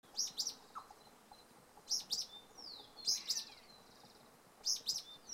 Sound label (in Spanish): pijui colaparda; LCalel